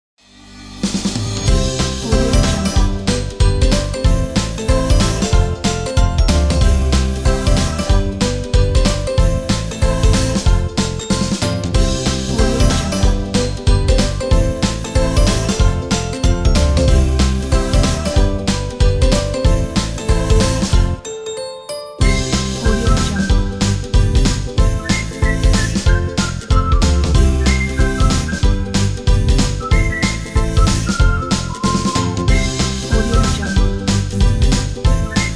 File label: cartoon upbeat